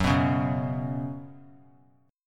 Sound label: Gb Suspended 4th Gb, down; strummed; JGuitar